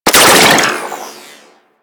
Freeze Ray Shot 3D; Warframe; Weapons; Corpus

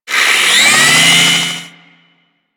Space Pod Melee Arm Start, Vehicles; Grineer; Warframe

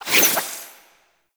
Mote Pick Up; Tau; Tau Focus Ultimates

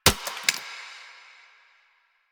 Shot Mech, Weapons; Warframe; Acceltra Prime

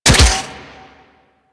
Daikyu Bow Shot 2D A; Tenno

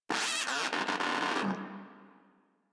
Moa Aggressive Vocal Enemy Spotted; Warframe

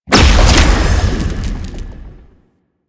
Spawn Large Enemy; Warframe; Man In The Wall; Enemy Gameplay

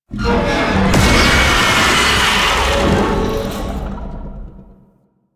Tank Infested Vocal Ability; Enemies; Barks; Warframe; Lasrian

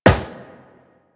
Run Metal, Footsteps; Warframe; Tenno

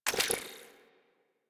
UI Crew Issue Order; Warframe; Railjack Player Ship

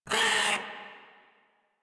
Kavat Vocal Pain; Warframe; Khora

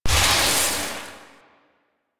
Shotgun Hit Fx Stinger; Weapons; Warframe; Pax Duviricus

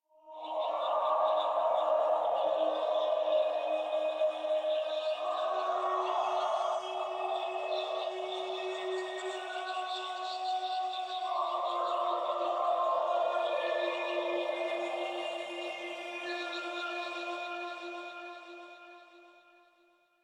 Choir; Warframe; DE; Sentients

